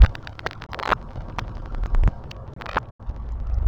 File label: Glitchy, Beats